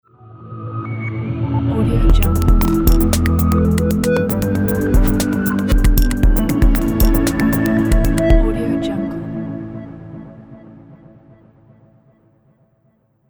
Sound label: elegant digital logo